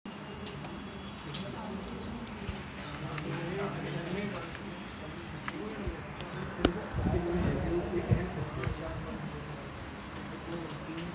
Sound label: karaoke recording